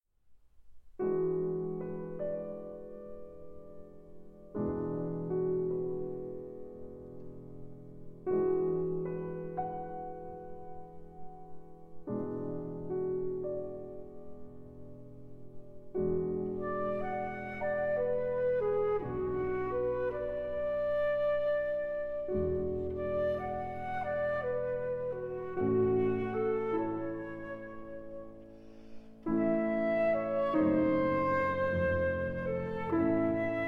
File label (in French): Sonate Pour Violon et Piano en La Majeur (Arrangée Pour Flûte et Piano); FWV. 8; I. Allegretto